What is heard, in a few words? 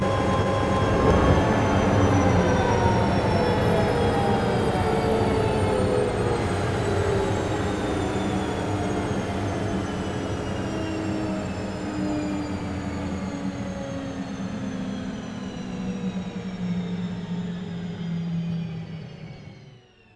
M1 Engine Shutdown